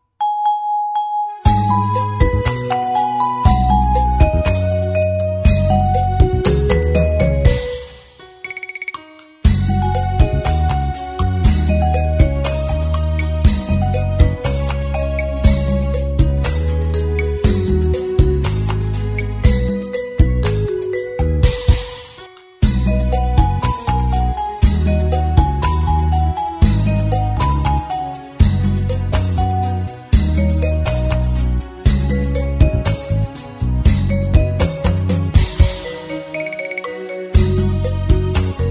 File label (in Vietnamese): beat